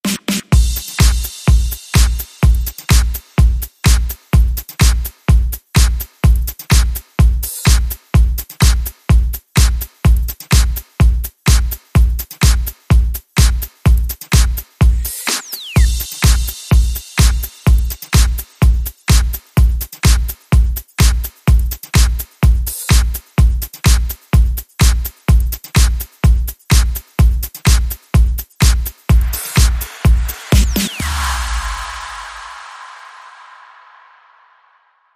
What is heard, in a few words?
Beat